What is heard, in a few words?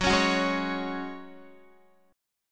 Gb Suspended 2nd, Guitar; Gbsus2 strummed; x,9,11,11,8,8; 4E,4A,5D,5G,5C,6F